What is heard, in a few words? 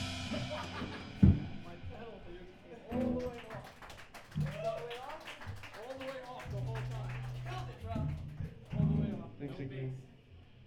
Pigeon